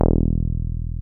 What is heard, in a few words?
303 Basic E2; Drums In Your FACE!